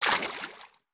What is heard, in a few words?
FS Water Deep Wade, footsteps